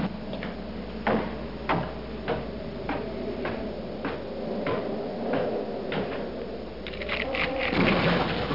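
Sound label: footstep; ftstep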